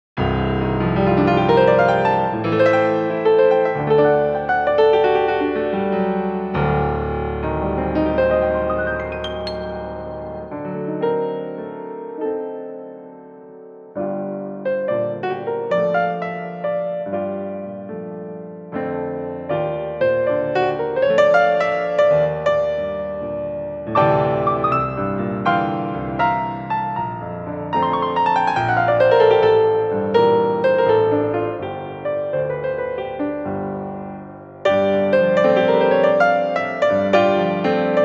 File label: Piano Ver.; the legend of heroes sora no kiseki fc evolution